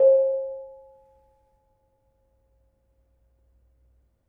Bonang Barung Sl 1 soft, gamelan; gravityharps; timbrebox

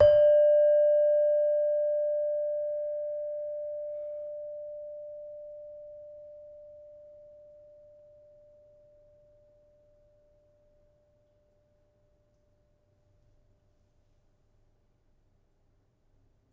Saron hard, gamelan

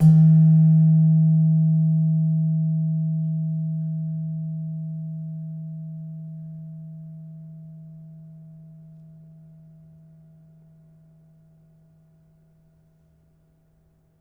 Slentem, gamelan

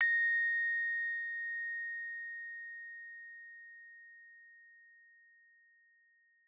Gamelan Chromatic One Hits Long A#5; Gamelan Chromatic One Hits Long 24 Bit; gravityharps; timbrebox

